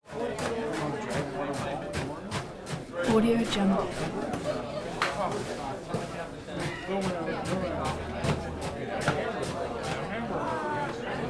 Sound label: bar walla constant active conversation